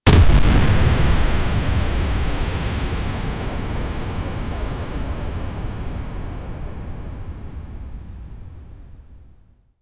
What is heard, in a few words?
dynamic close up shot a d, nuclear blast